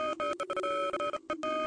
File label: short morse, filtered morsecode like